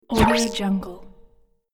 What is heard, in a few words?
tile place squelch